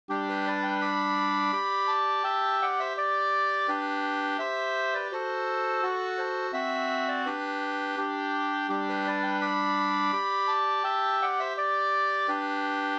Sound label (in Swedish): skanklat